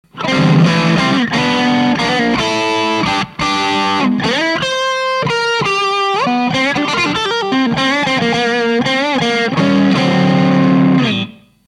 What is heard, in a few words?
4558, BOOST, NJM4558D; LEVEL3時 DRIVE9時 TONE１時; キット付属のNJM4558Dです。; F-DRIVE